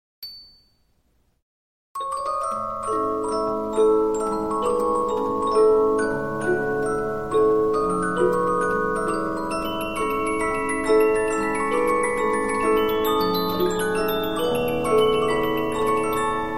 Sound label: Polyphon, 15inch disc musicbox